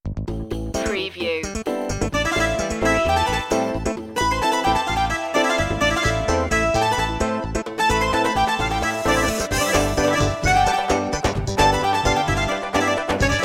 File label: egyptian dance